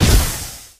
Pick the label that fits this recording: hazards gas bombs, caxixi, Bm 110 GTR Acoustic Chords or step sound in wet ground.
hazards gas bombs